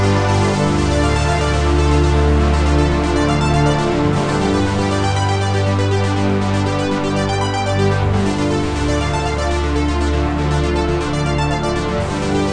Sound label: 80s Space Game Looping; star explorer solar2d game